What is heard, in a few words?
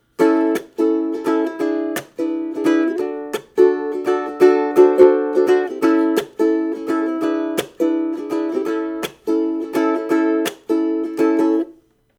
La La Blues Strum, ukulele